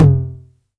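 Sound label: 909 high tom tape; Toms; Goldbaby Tape808 & Tape909; The Tape 909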